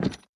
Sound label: soldier land; toystory; sarge